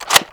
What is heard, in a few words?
bolt push, l96; weapons